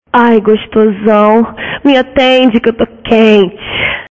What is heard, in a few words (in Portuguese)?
Mulher Excitada